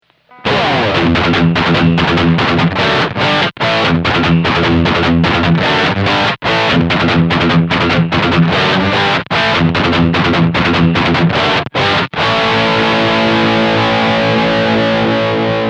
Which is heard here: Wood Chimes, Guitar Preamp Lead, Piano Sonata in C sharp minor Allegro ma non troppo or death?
Guitar Preamp Lead